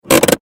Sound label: transportation car handbrake applied; Car Handbrake Apply; Cars; Vehicles